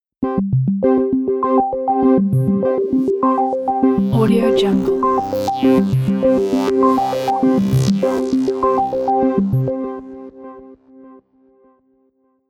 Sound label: tech logo opening